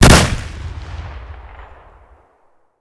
mr43 doublefire distant; Escape from Tarkov

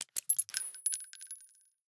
python eject shells; Rust

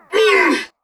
pain grunt, Human Pilots; Titanfall 2

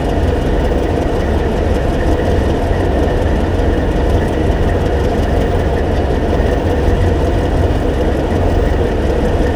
AAV7 Engine Idle; DCS

